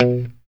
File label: POP B 2, STRAT guitar; Spectrasonics; Hans Zimmer Guitars VOL-1; JC-STRAT POP